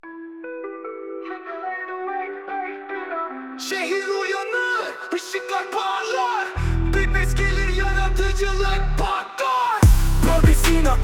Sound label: background music